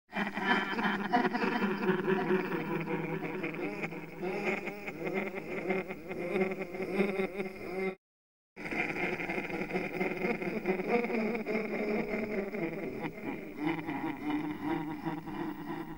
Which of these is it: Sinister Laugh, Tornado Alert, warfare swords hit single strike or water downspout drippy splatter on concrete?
Sinister Laugh